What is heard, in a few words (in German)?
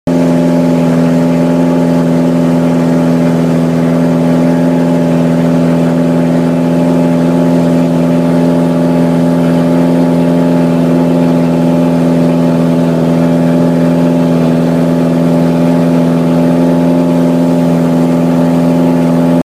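während der Fahrt; Nam Ou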